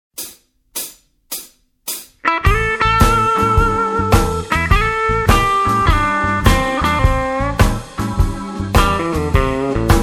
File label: blues jazz